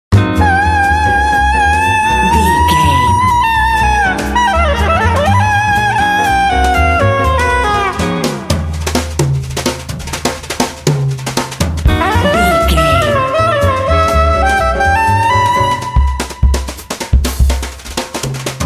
jazz soprano chaser

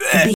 voice male grunt pain

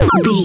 retro collect pickup item

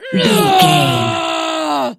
voice female a battle shout

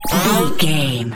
sci-fi power up

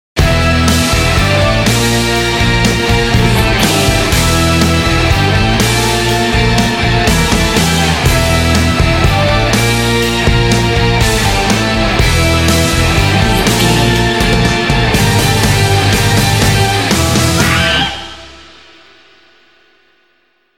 raw rock and roll sting

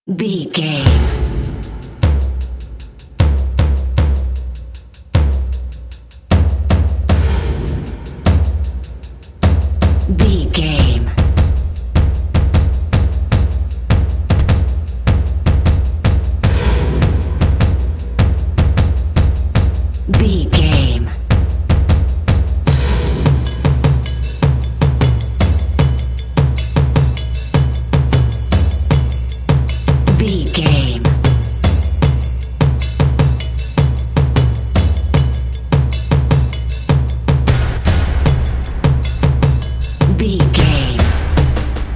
orchestral tension and suspense percussion only

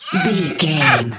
goblin fairy effort push fast

